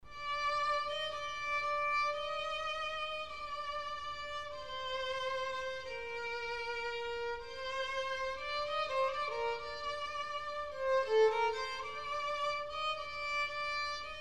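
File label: chorale violin; wedding processionals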